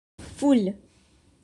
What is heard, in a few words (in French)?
foule